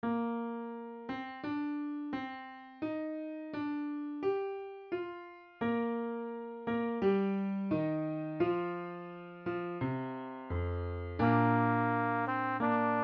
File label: Trumpet Solo; Emperor's March Trumpet